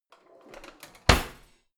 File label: old drawer creaky slide in hard